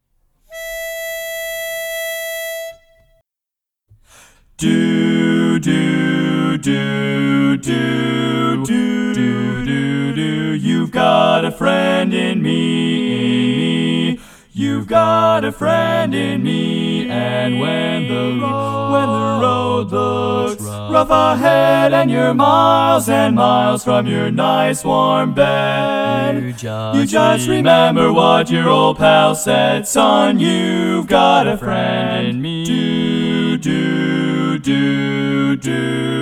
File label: tenor missing in E